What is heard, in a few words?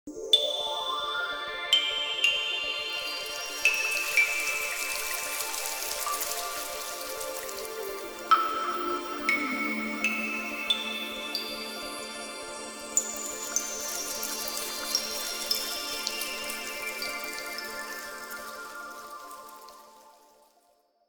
Water background music; Nokia; Audio themes